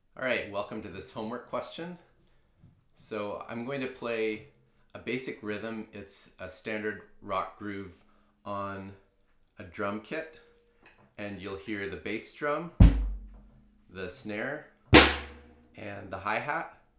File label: Rockgroove